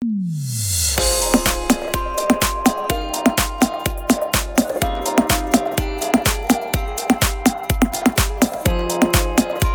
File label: Electronic Version